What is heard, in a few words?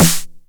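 909 SD Tape, snare drum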